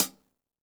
hihat - closed; JazzFunkKit

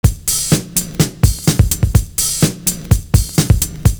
SNR ON 2-3-4, loop; Univers Sons - Kitch 70s

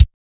CRISP KICK; NATUR. K1

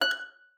STOP F6, harp; Xsample VOL-8; HARP+FX

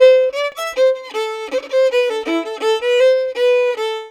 VIOLIN, Celtic

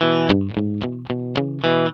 ROCK E 110, Vintage Blues Guitar; USB Soundscan vol.22; AKAI; ROCK2

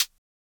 closed HH, Univox SR-95

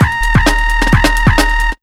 FX LP, Big Beat; USB Soundscan vol.25; Big Beat Drumloops; AKAI

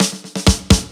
Fills 128BPM, Clubsounds

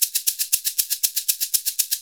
SHK, Shaker; Univers Sons; Basicussions

